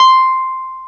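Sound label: Wurlitzer C6, Keyboards Old School; USB Soundscan vol.09; AKAI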